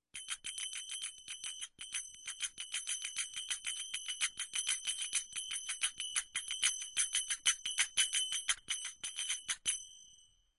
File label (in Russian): Kolokolchik muzykalny; Колокольчик музыкальный; Любой звук колокольчика, корабельного, музыкального, маленького, большого, одиночные удары